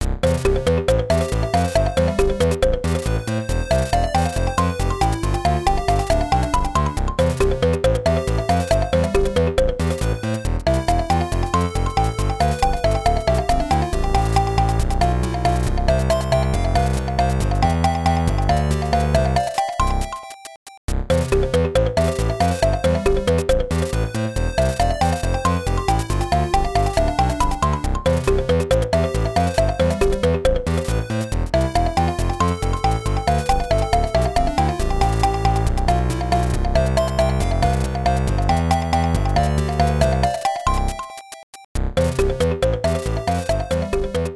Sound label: Conversation Screen; Densetsu no Starfy; GBA